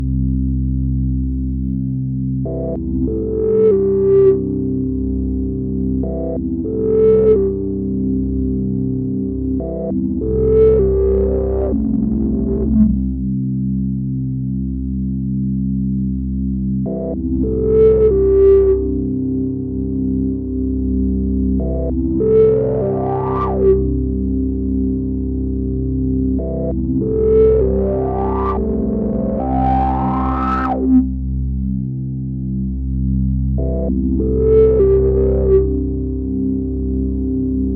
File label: riff